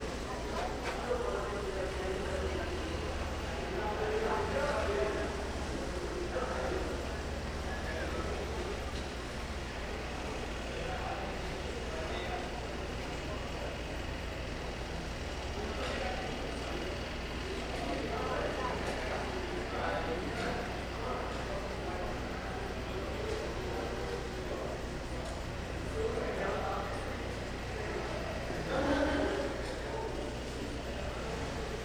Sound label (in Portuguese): Ambiencia unb reverberante com pessoas e motor; universidade de brasilia